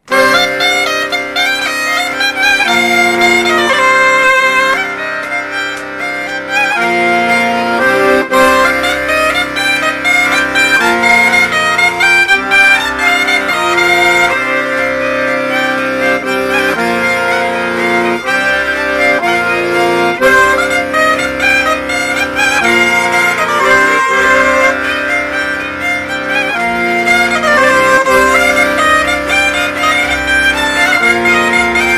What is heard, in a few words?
Laridé